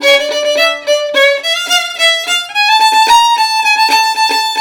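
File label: VIOLIN LOOP, Asian Traditions; USB Soundscan vol.60; AKAI; VIOLIN LP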